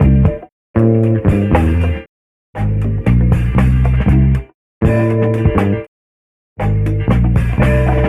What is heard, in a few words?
bass starts and stops, Phonky loops